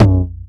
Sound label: TOM, House Side Of 2 Step; USB Soundscan vol.51; AKAI; TOMS